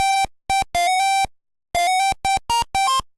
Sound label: Jingle; Mario's Cement Factory